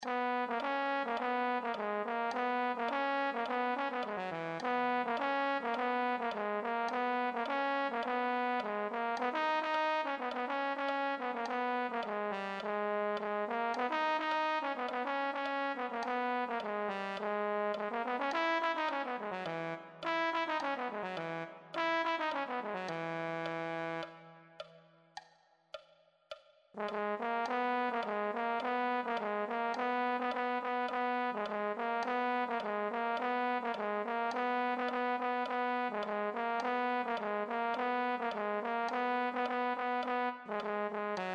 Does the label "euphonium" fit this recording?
Yes